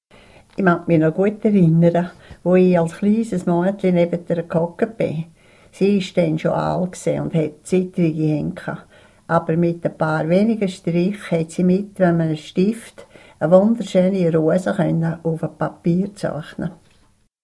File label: sax